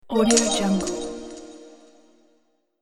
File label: treasure gold revealed